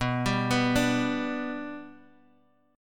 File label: B Minor Sharp 5th, Guitar, Drop D